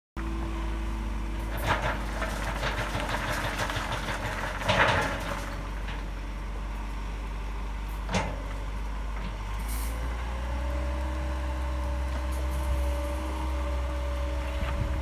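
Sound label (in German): Feldbahn Gabioneneinschnitt Wasserwerk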